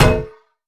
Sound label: salvaged hammer strike; Rust